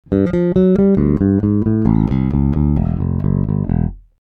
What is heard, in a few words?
finger, aria